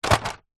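leisure radio controlled toy car large plastic set down drop carpet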